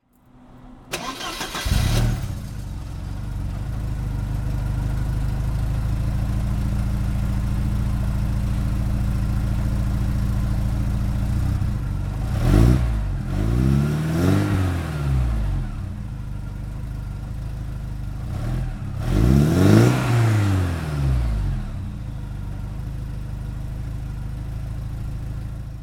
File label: Porsche 944 Turbo